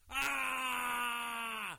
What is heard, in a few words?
scream